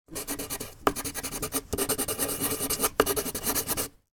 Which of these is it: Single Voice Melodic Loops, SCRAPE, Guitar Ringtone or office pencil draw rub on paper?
office pencil draw rub on paper